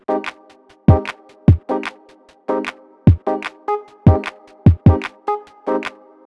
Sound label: Dance Chill Out